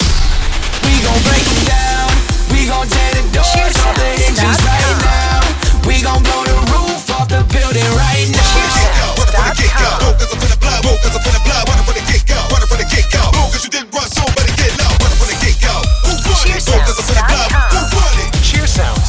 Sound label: kaboom explosive theme